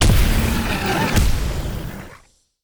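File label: Garden spirit death, monster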